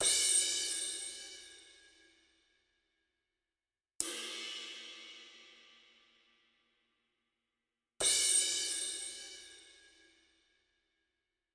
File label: Crash